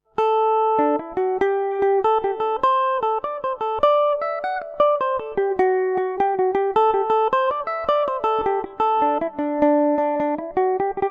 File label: garrett barrys jig dmix, mandolin; Revelation Jaguar electric mandolin